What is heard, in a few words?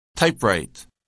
typewrite